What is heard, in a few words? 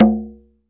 BUGARA, AFRICAN PERC; Greytsounds VOL-1